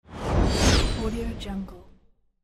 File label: whoosh gliss